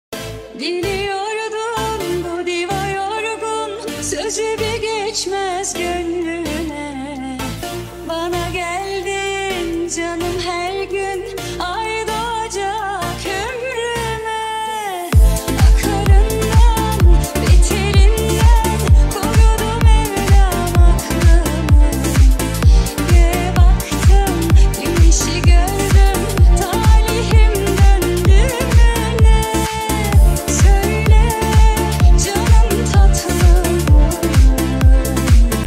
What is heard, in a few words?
remix